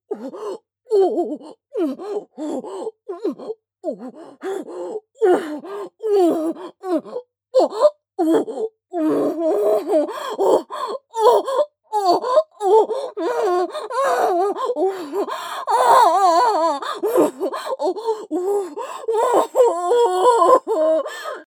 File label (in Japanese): 2穴同時騎乗位・オホ声・通常; ヘレナ